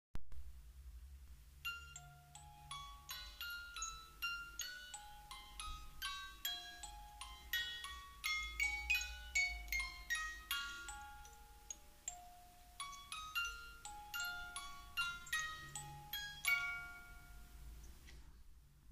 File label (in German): Spieluhr